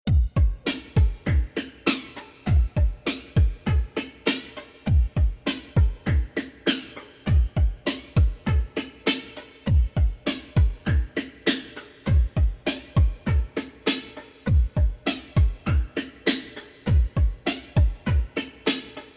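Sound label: C 100 Rhythm Groove Heavy Processing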